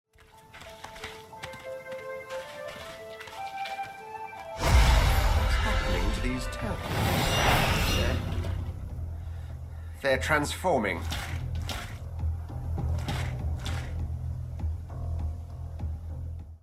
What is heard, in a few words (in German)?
dynamischer soundtrack; divinity original sin ii